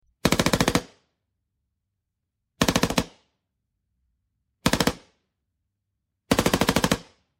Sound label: shooting